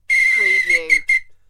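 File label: Referee Whistle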